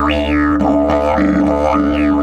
108, DIDGE 108BPM

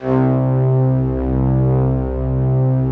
ST ORG, STRINGORGAN, Yamaha VP-1

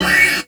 SLIDE, CELLO FINGER; Xsample VOL-5

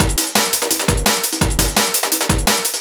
Nine Tree Beats, breakcore breaks

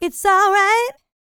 licks, vocal, dance; ILIO; Vocal Planet

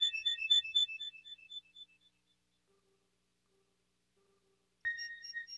SYN, Hip Hop and R&B Drumloops; Best Service ProSamples vol.01; AKAI

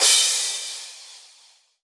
Crash, Cymbals

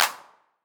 909 Clap; Goldbaby Tape808 & Tape909; The Tape 909

